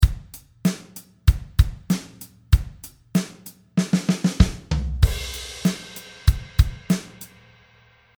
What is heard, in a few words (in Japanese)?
タカタカタカトン